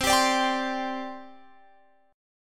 C Suspended 2nd, C, down, Guitar, Open F; Csus2; strummed

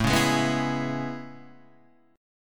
C sharp Minor 7th A; C#m7

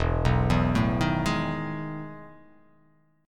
E Major 11th E down; EM11 arpeggiated; JGuitar

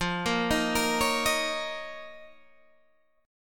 F 7th Suspended 4th Sharp 5th F, Guitar, DADGAD

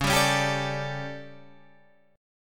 A 11th Db, guitar chord

